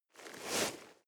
nylon leather soft short

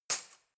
cutlery knife drawer place

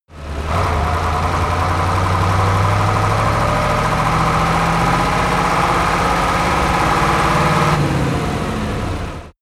truck ural 4320 driving accelerate

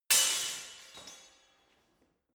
sword hit sword single

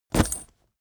leather metal bodydrop

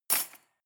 cutlery cake fork drawer place hard

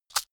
rice shake

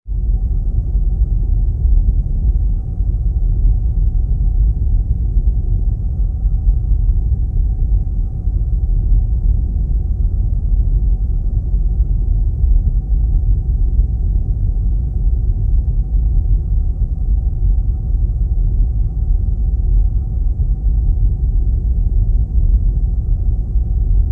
deep hot noise diffusion

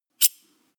sharpen knife fast

